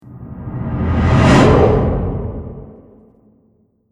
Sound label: Trailer Hit Monster; sound design